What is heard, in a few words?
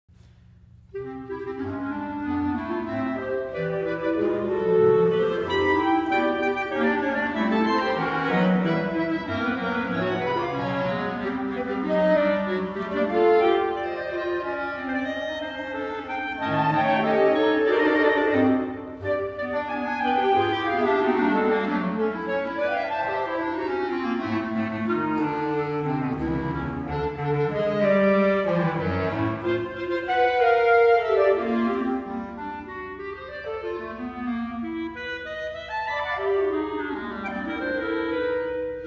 Fanfare for Clarinet Choir live; Tennessee Tech Clarinet Studio; Wonkak Kim, Director